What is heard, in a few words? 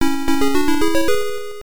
compile fanfare; Blaster Burn Budruga Episode III; msx2; psg